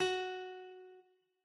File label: harp; minecraft